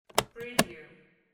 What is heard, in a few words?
briefcase lock